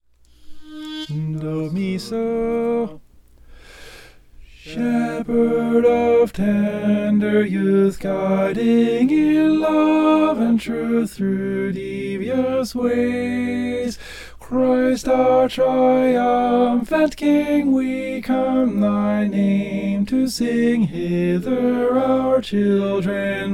shepherd of tender tenor

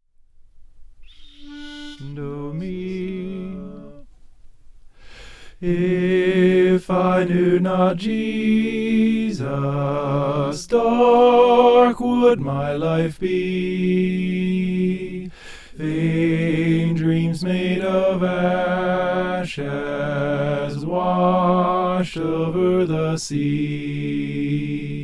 soprano

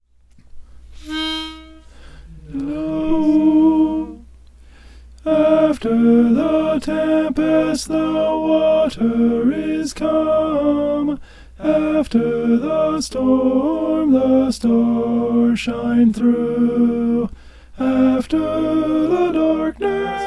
alto

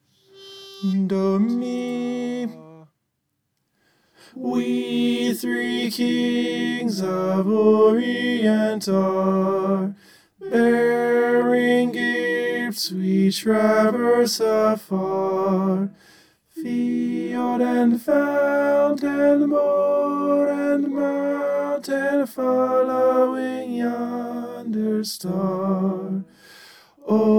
tenor

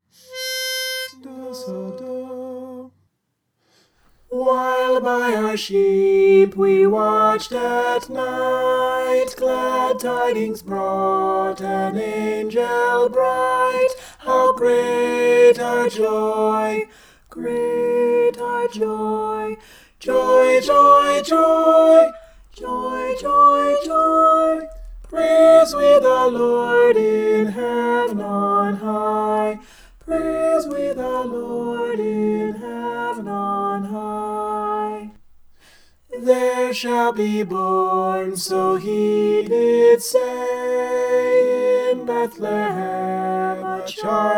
soprano

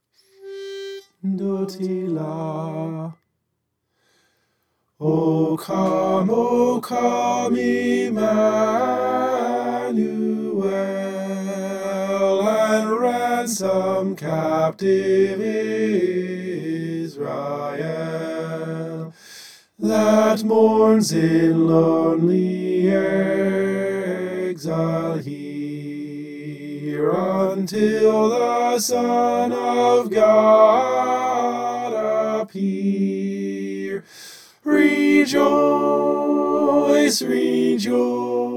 soprano

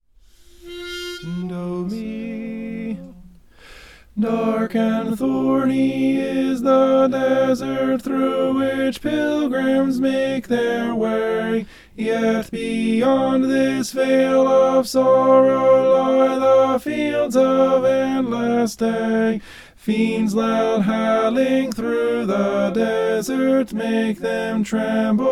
tenor

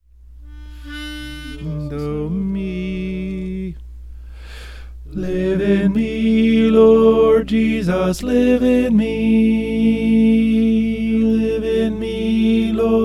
tenor

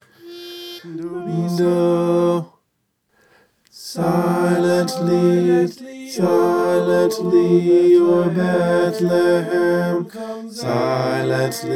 bass

